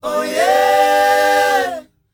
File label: O YEAH, S. AFRICAN, World Voices; ILIO; Vocal Planet